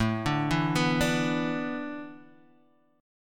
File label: A Suspended 2nd Suspended 4th, Guitar, Standard; Asus2sus4 arpeggiated; JGuitar